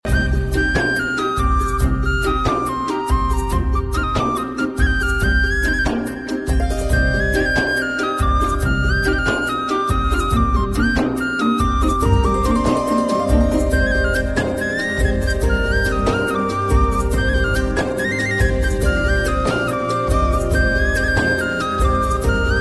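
magiceskaa flejta instrumental naa melodia, magic flute instrumental melody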